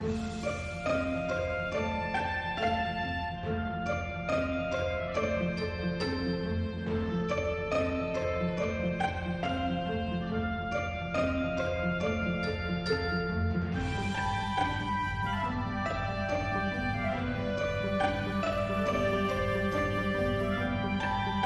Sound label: music conclusion; The Mystery Team; PSP